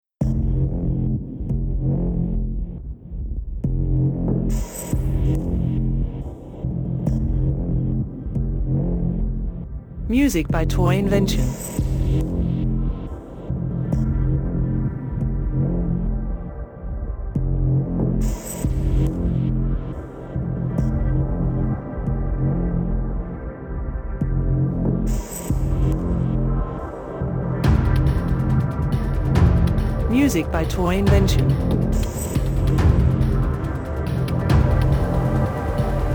no bells strings

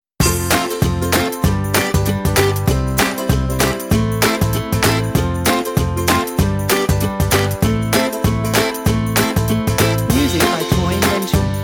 Feel Good Trip background

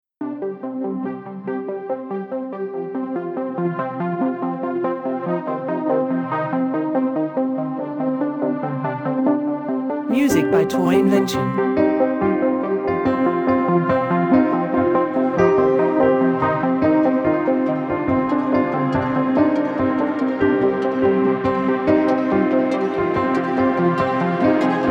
Futuristic Showdown shuffle rhythm

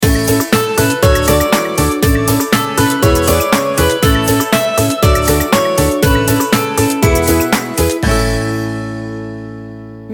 Have Fun stinger

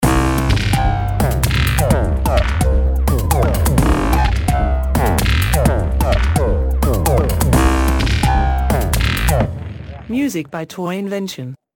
Cyber Crime stinger

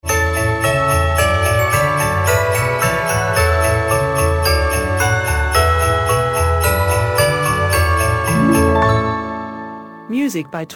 Christmas Joy stinger

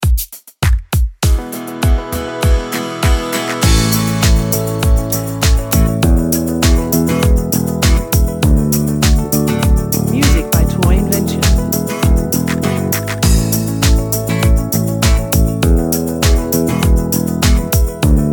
A Feel of Spring background

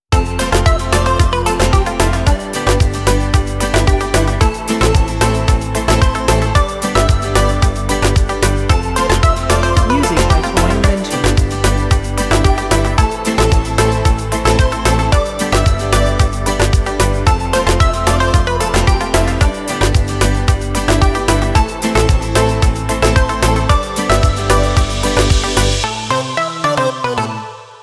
Summer Reggaeton

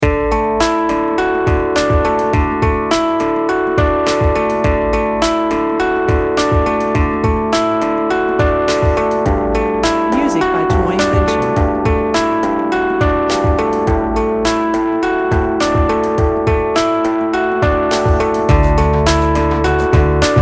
Corporation Growth no glockenspiel

